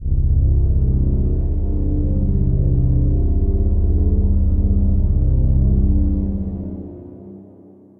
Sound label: MPE Hall of Mirrors, Pad; Ableton; Drone Lab